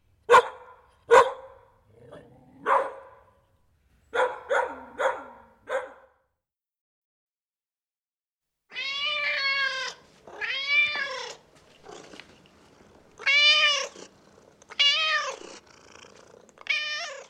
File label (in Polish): odgłosy zwierząt